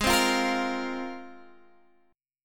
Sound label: Ab 6th Add 9th down Guitar Standard; strummed; JGuitar